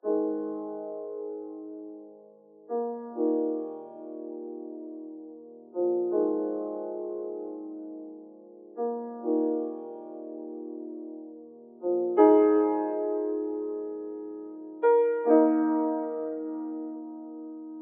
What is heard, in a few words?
158 BPM D# Min Low Keys; Loop Stems; Cymatics; Fill Up My Mind